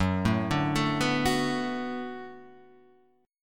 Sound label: D 6th Gb, chord